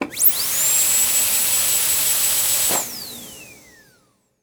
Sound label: Industry Tool Drill Dentist Drill Turn On Off Short